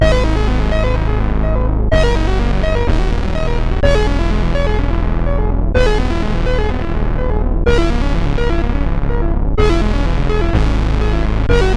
sid, CSG8580; hvsc

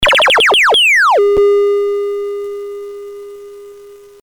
CSG8580R5, GAMES; Tron

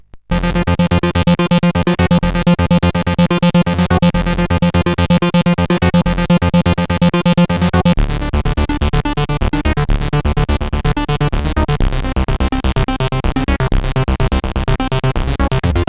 Techno sid CSG8580R5; ECM; hvsc

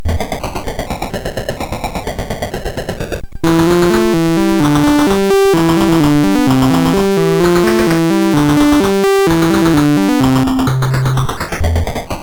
Jodler sid CSG8580R5

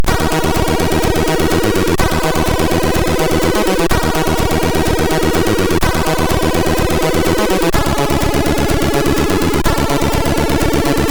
Techno, MOS6581R3; Techno 2 second

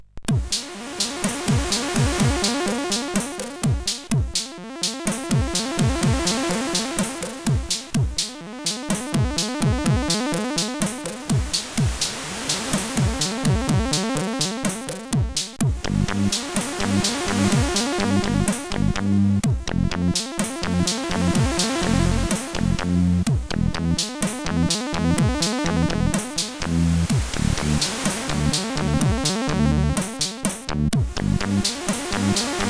sid CSG8580

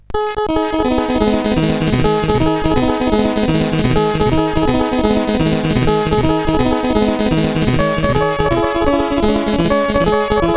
Acid, CSG8580R5

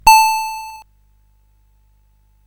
Paddle Mania, CSG8580R5

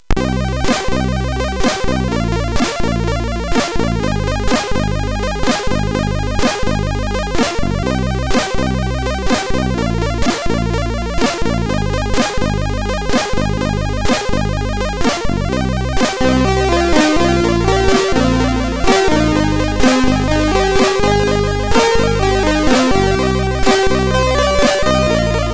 End, MOS6581R4; sid; Babygang

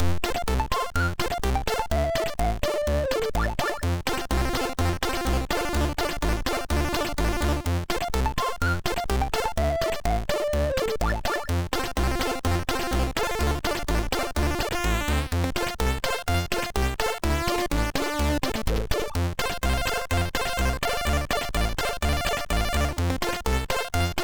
Guldkornekspressen Lvls, MOS6581R2

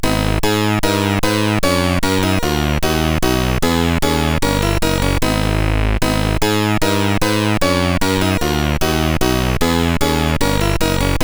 Bach CSG8580R5

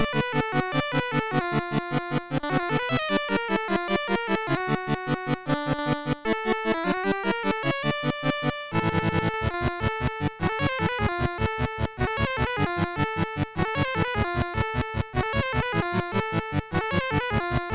Rave, MOS6581R3

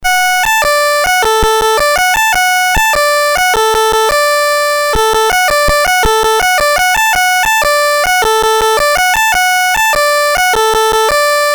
BASIC sid MOS6581R4, GAMES; Transmat

